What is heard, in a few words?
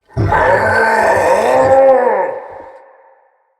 Fearful Cry; Battle; Mendicant King